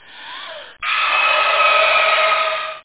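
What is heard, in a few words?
wall hit; The Dream Machine - The Virtual Sexual Experience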